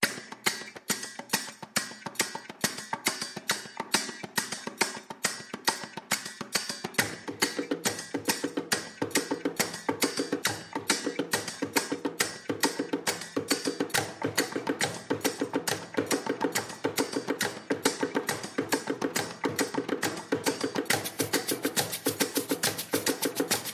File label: Rwandan Ritual, Small Perc Only